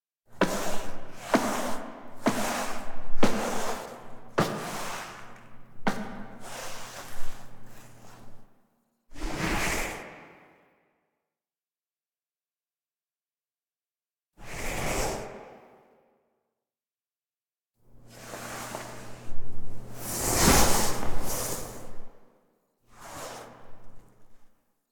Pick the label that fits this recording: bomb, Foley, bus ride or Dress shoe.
Foley